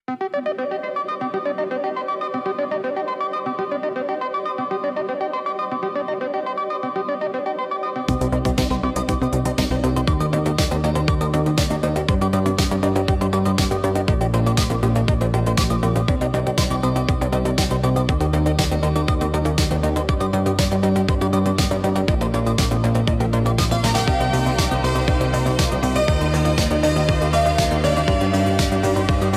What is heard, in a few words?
Electronic Impulse